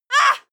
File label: female moan pain short; koerpergeraeusche; menschen; soundpool